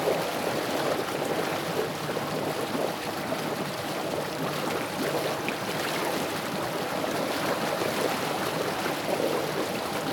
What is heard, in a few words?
Riverboat Move